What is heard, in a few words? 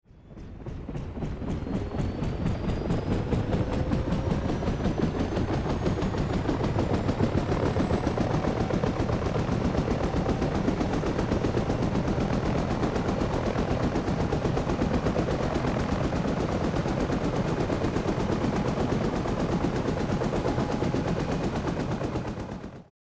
Helicopter engines turning on firing up chopper start